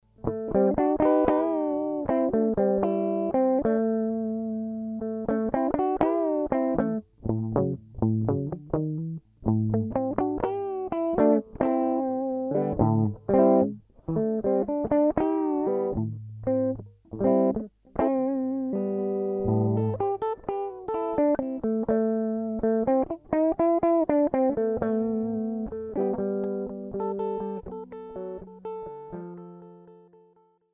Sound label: LP Custom Neck Bridge